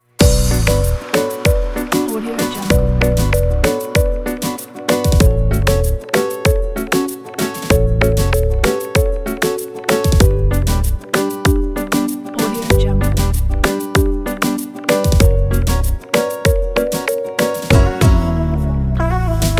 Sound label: latin colors